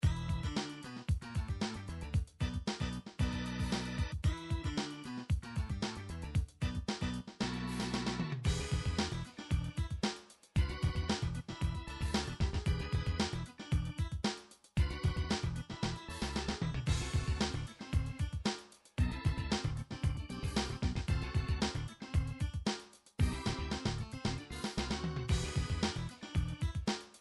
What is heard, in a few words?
funk demo song